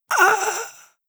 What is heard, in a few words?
death groan male